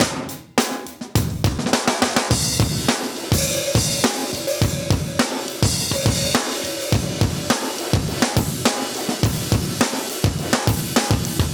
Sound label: Intro, breaks, Live Breaks